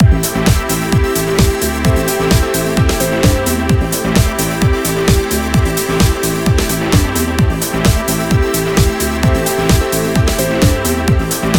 music; table tennis world tour

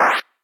pick Up; todee and todpee

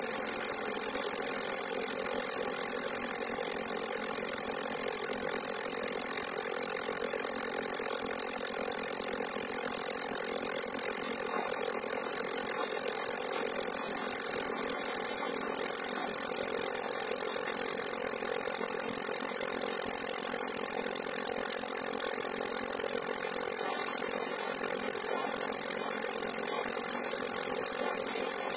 biplane; aviamaster; Aviamasters

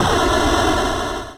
a UFO Shake

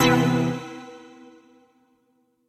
intro Worlds; todee and todpee

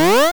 jump; choppy orc

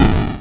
Knockout

